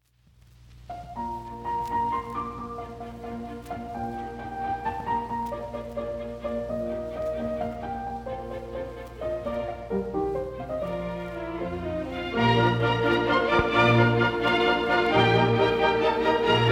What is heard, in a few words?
piano concerto; mozart